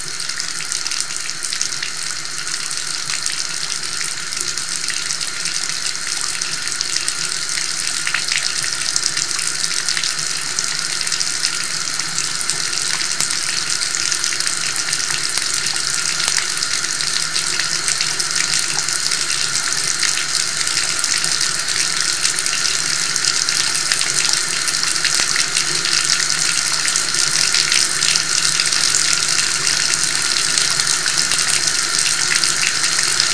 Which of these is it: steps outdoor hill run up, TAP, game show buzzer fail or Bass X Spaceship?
TAP